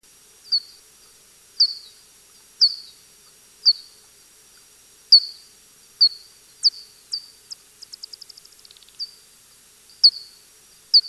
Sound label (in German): Zwerg Feeding Buzz verlangsamt